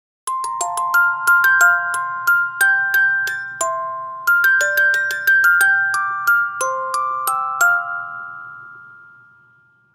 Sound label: Music Box; Assassin's Creed Syndicate